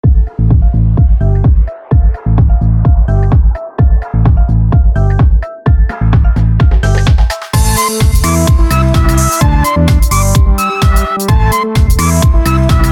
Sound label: Funky House